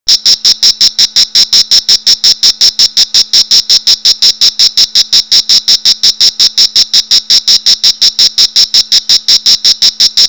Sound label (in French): cigale